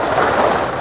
Subway; SNES; Spirou

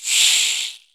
CABASA; AKAI S-Series CD-ROM Sound Library VOL-5

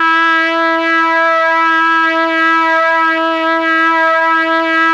Mbrase4, MelloBrass; Oldies

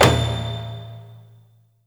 A#3, JAPANI PERC; Northstar; The Wizard VOL-1

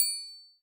Triangle, Alesis D4

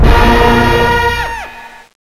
FZ10 BRASS; OLD SYNTHS; Rubber Chicken Software Sample Bank

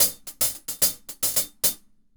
Hi-Hat Groove; Hi-Hat Grooves; Sonic Foundry (Sony Creative Software); Vital Drums

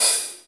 HH, KIT Real-Kit; 90 s Sample CDs; Sound & Vision - Gigapack I CD 1 (Roland); Real-Kit s10

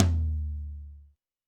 Tom Lo Studio, Alesis D4

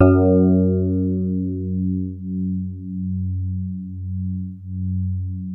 DX7 mid F#2, e-piano; Music Store

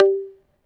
T.BASS2 G3, TAKK-BASS, Yamaha VP-1; Music Store

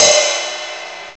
CYMBAL; CMI Sound Library Rev 1.3; CYMBALS

